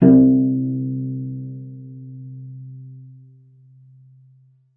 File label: cello pizz C2; 90 s Sample CDs; Xsample VOL-5